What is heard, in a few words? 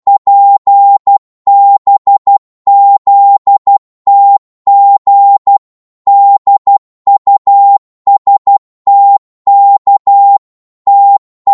12wpm, Morse